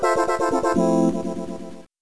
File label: creepy